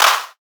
Clap

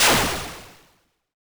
Blade

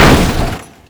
Impact Car Light

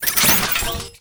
Enemy Ufo block Common Appear

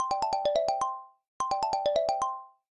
Phone Call

